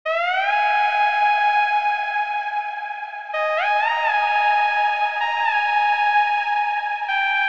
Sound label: Strings; PatchFactory